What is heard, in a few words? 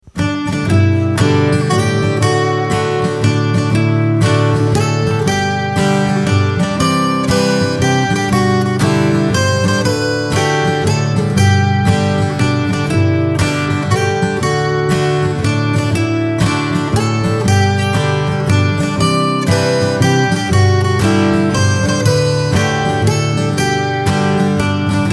Happy Birthday Ringtone